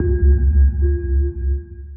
sonar Tail Suit Far Shuttle; Barotrauma; Real Sonar

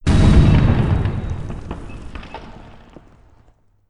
structure blunt; Subsurface; Barotrauma

